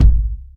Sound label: reverb bass drum sound g sharp key